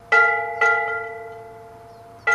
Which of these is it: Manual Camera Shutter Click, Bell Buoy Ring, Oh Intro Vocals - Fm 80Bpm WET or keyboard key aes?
Bell Buoy Ring